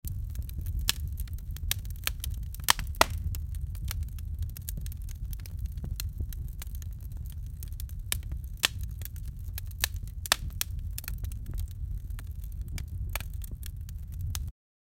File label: campfire crackling fire; cozy